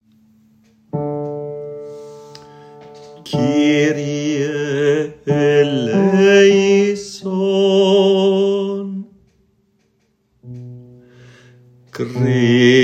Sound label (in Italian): Kyrie bassi; Messa terzo messale; coro diocesano